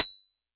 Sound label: Xylo Snare DX100 B7